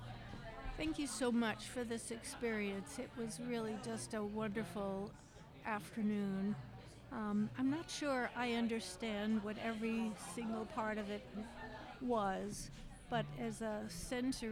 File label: Audience Recording; a-meal-premiere